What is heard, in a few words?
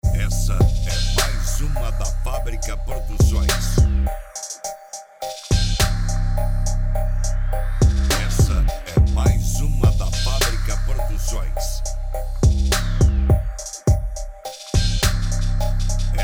beat trap